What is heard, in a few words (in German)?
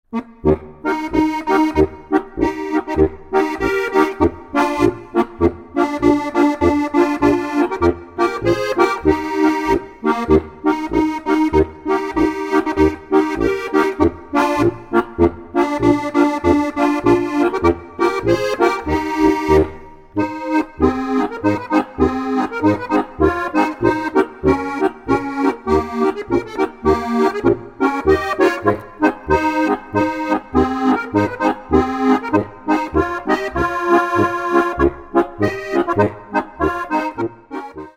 polka